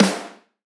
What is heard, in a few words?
acoustic snare